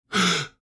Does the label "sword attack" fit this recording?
No